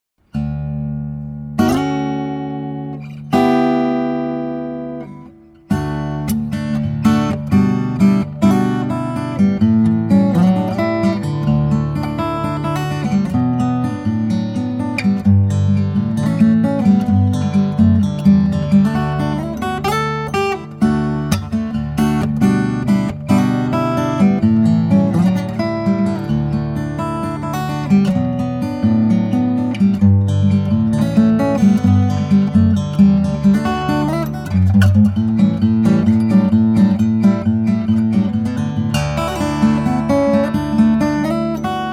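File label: Guitar Sample 14b, Rosewoods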